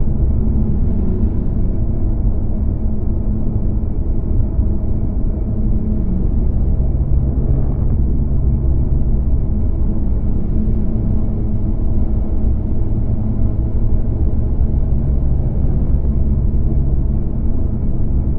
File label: starbase hum layer; apocalypse